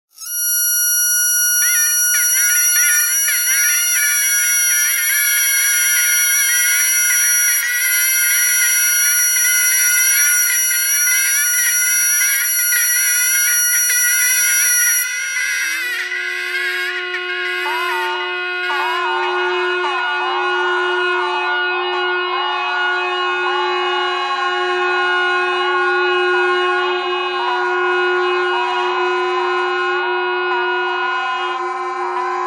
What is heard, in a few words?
bagpipe geese bagpipe thread noise